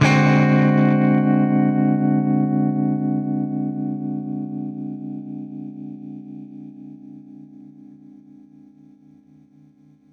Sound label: Baritone Chord D7b5, Guitar Chords